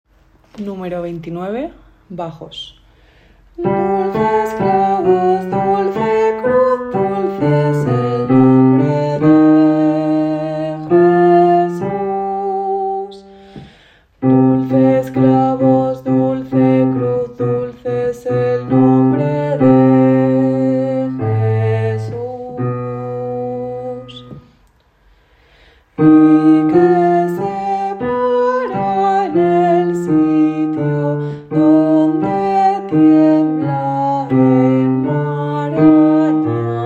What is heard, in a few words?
baj; Bodas de Sangre